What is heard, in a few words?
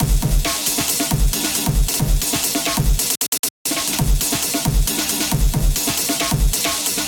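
drum beat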